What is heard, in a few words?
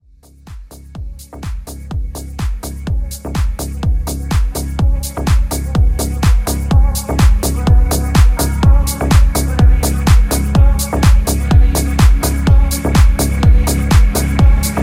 Future House Intro; Remixfreakz